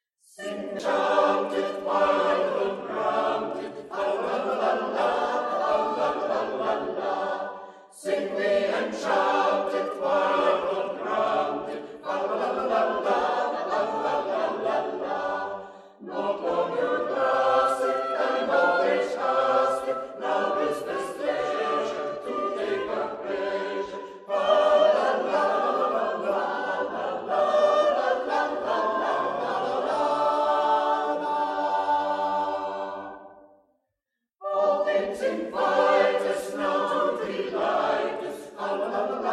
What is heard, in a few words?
Sing we and chant it; T Morley